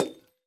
铜灯踩踏声

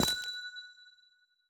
紫水晶踩踏声

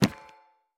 錾制书架取出附魔书声

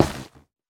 菌岩 踩踏声

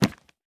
錾制书架取出书声

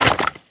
凋灵骷髅脚步声

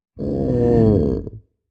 待机声; 嗅探兽

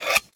蜂箱剪取蜂巢声

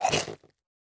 炽足兽进食声

